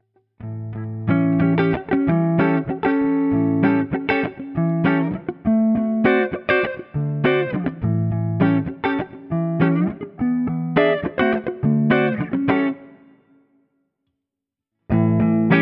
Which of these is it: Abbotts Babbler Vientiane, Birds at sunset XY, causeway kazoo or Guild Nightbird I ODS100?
Guild Nightbird I ODS100